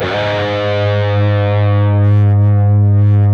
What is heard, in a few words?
PRS PINCH, Guitars